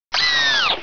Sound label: Mecha Spin Down, weapons